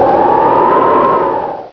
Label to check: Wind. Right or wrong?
Right